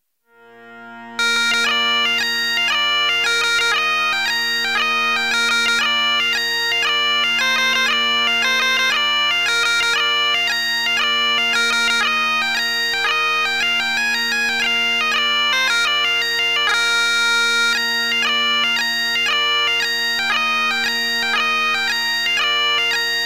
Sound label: Banjo Breakdown